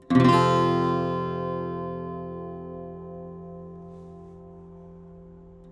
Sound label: Chords; 9inches; Presonus; Jensen Xfrmrs